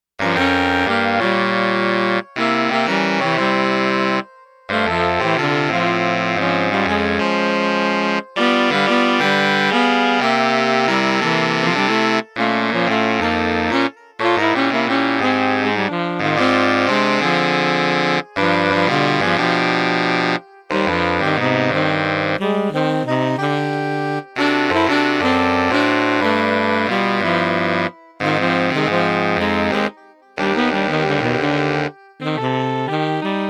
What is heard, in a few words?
Sax Sunday